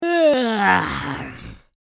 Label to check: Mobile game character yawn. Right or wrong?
Right